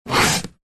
foley cardboard box flap move fast

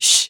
human voice shh female; sound effects one

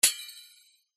musical instrument ching gamelan single hit

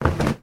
towel fall on floor; foley

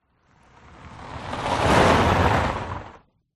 vehicles car approach dirt gravel skid stop fast

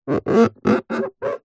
metal rub finger squeak; zapsplat

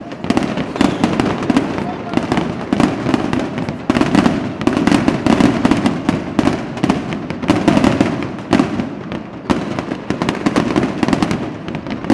fireworks display intense; sound effects one

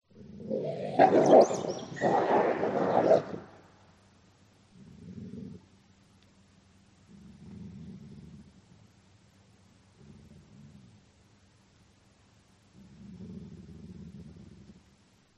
Wolf; Audio Hero

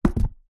household toilet roll down on surface; zapsplat

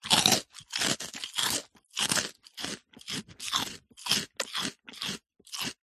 human eat crunch bite sequence dry cereal

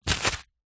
money pouch fabric coins down on surface; zapsplat

